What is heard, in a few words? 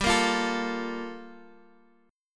G Minor 9th Guitar Drop D